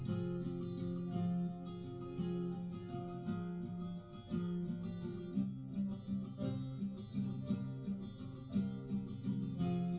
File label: nodrums, live rec